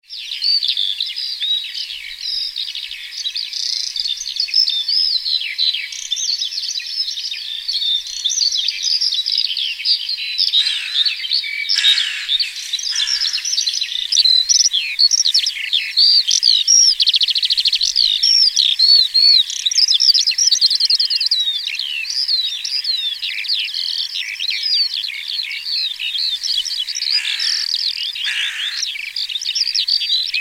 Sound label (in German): Lerchen